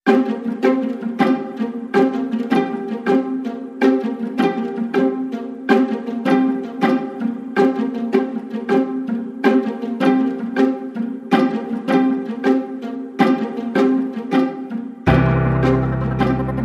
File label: Strings And Pulses Only